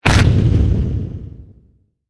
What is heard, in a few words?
sonic boom; Ballistics; Speeders